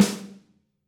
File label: drumset d; Golden Crayon Sounds